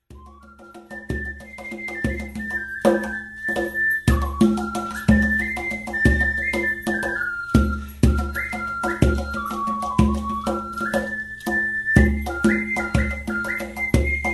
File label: whistle and drum